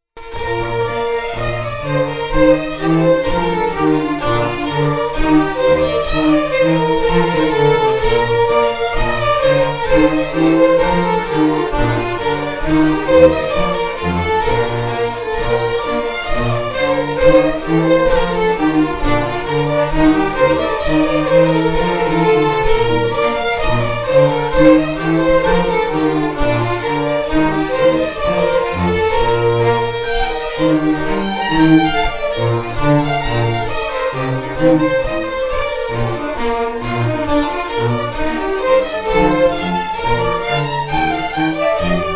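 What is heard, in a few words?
Hornpipe, Scottish Fiddling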